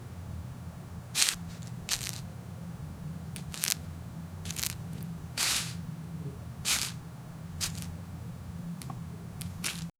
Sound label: sound of a persons foot